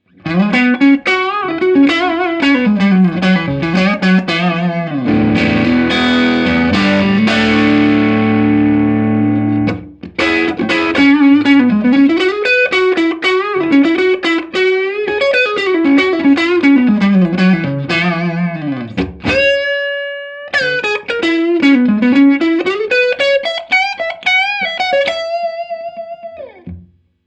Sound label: overwound crunch neck; schwarzbach